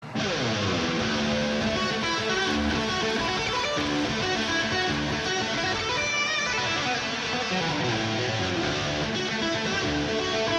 guitar solo

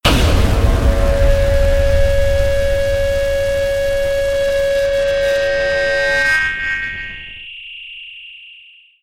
hit shred; discord; Brand X Music

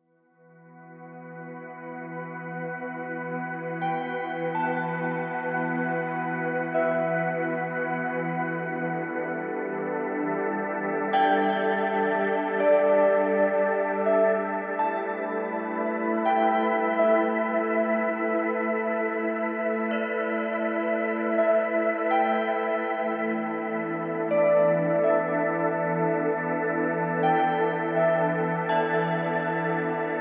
seven bells

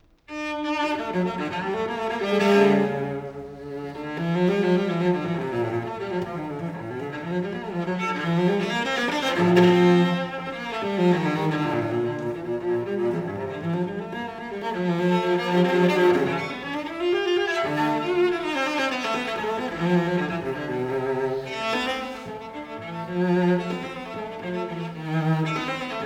suite for cello solo no 2 in d minor iii courante; johann sebastian bach

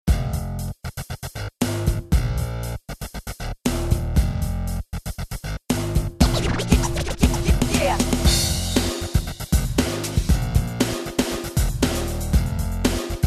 scratch beat

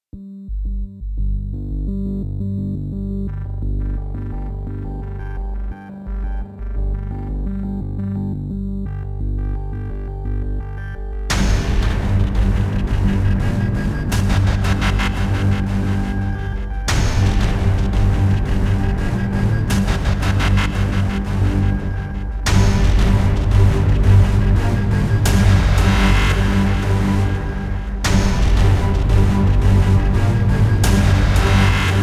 no choir

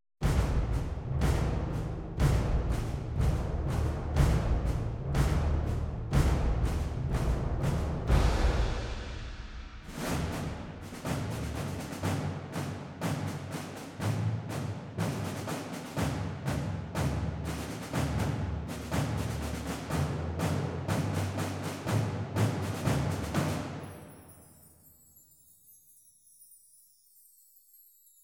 march of the kings percussion only

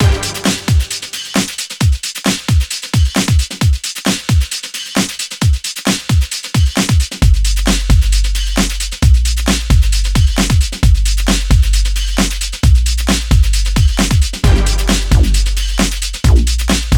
drum thunder

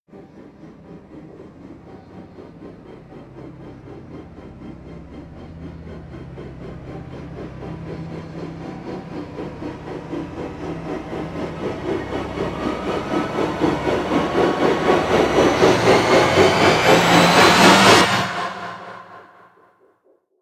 rise; timebomb

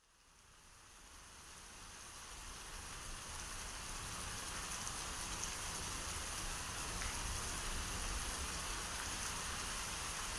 sounds of tropical rainforest

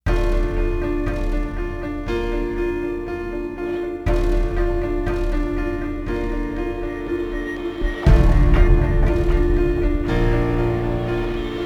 no choir

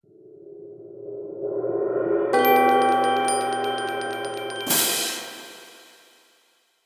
perc epiphany

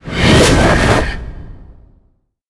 whoosh angry bird calling

